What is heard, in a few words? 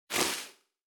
snake pain; En kasket snake pain2; Fight Knight